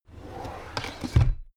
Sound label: drawer cabinet crammed close regular